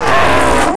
pain, player; midnight guns

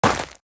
foot dirt, footsteps; midnight guns

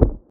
foot carpet, footsteps; midnight guns

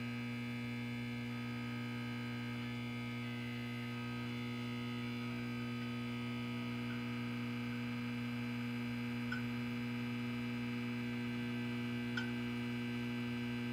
hum flor; midnight guns

